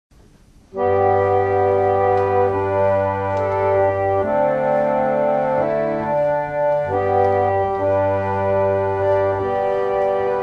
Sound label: organ